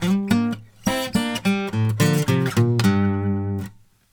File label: Blues Guitar; ILIO; Fretworks; LICK